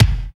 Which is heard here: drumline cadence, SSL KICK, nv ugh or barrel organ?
SSL KICK